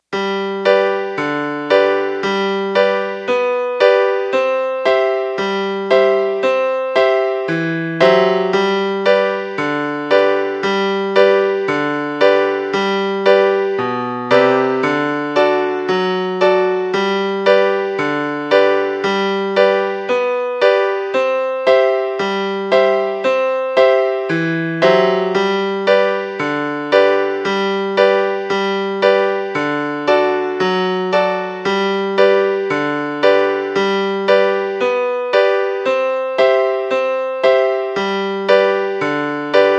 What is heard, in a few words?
accomp.; in G